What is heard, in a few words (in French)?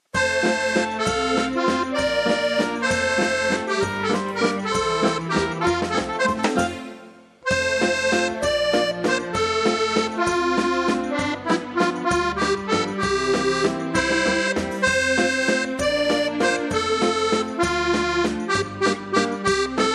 valse